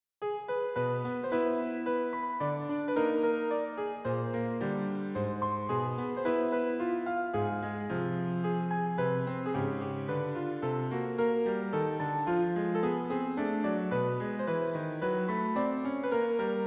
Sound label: waltz